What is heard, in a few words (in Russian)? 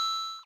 legkij tihij zvukovoj signal